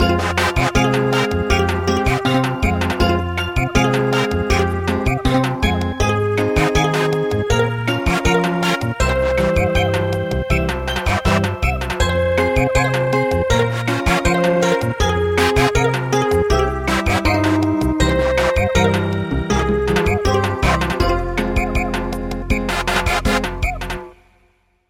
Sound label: Armenian lullaby Samba